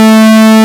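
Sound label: Juno-60 PWM; E-MU Formula 4000 Series Vol. 2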